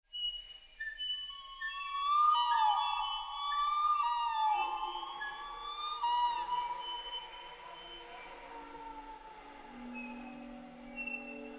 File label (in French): huards